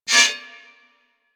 Melee Impact Metal; Warframe; Weapons

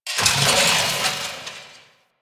Distant Machines, Ambience; Warframe; Corpus Railjack

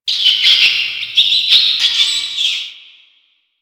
Amb Misc Subway Mice; Warframe; NineteenNinetyNine

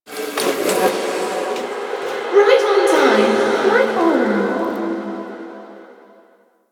Interior Cave, Ambience; Zariman Thrax Memories; Warframe; Duviri

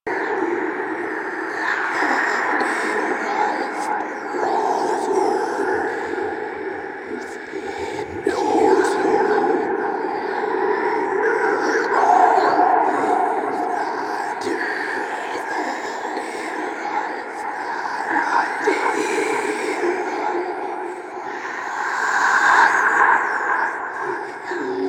Whisper Loop; Warframe; Ambience; Entrati

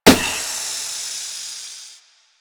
Car Tire Pop

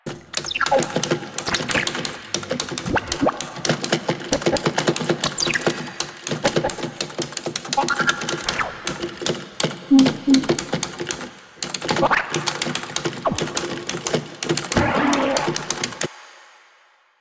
Arcade Finger Hits Loop; Ambience; Props; Warframe; NineteenNinetyNine

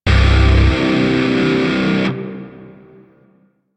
Shawzin Min Chord; Grineer Shawzin; Warframe

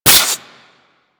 Inflate; Peculiar Inflation Mod

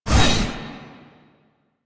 Blade Swipe; Weapons; Melee; Warframe; Dagath

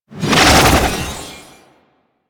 Archwing Prime Sword Whoosh; Warframe; Weapons

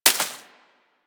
Bullet Impact Debris Grass; Warframe; Weapons

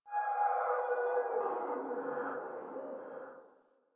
Landscape Distant Robot Call, Ambience; Warframe; Venus Open World; One Offs

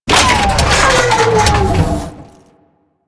Thumper Bot Death B; Warframe; Grineer

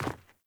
Sandals Land Rock, Footsteps; Characters; Player

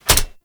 Sword And Whip Catch; Warframe

